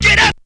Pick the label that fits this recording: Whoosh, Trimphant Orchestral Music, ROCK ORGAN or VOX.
VOX